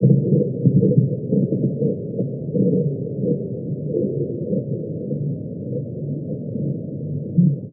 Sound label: Underwater crunch